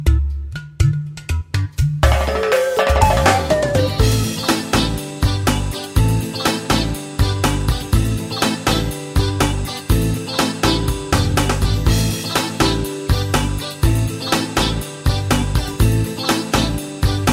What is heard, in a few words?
clay accompaniment, ocarina; mischievous